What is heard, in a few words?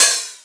Open Hat; FLPRO